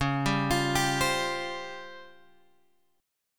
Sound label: C sharp Major 7th Flat 5th, chord; C#M7b5 Chord {9 10 X 10 8 8} arpeggiated